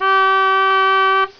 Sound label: BOAT HORN; 10,000 Graphics Pack Volume 1; Media Graphics International